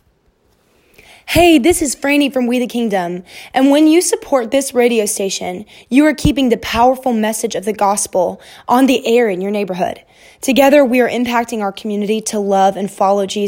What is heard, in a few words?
Powerful gospel on air